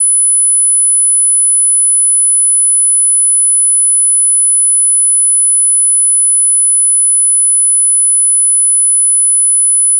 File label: test tone 10khz; speaker test